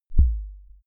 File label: sub kick clean